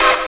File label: CHORUS CHD, Synthy Tonal; Distored Reality